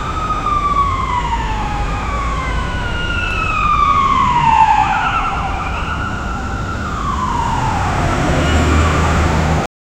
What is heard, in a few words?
distant city traffic with, car horns